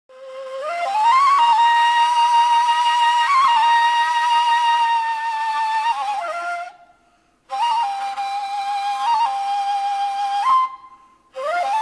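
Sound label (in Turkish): dilsiz çoban kavalı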